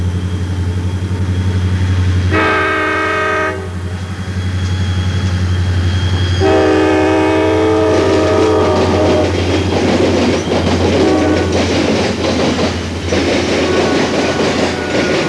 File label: Diesel; csx